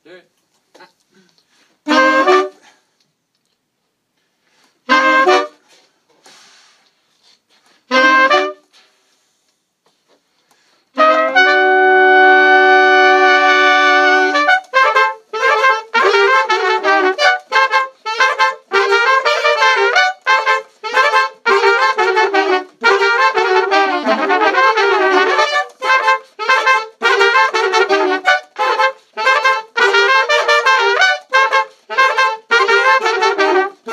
Kocanska melody sectional, rehearsal

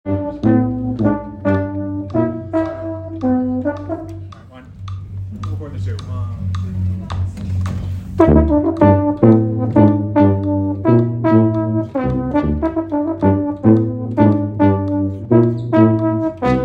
low brass, Rehearsal Recordings

